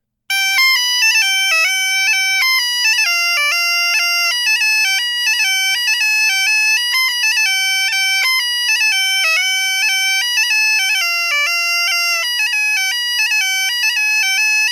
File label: punteiro c melodie